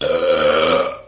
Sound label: Electro FX; Ueb HE Electro FX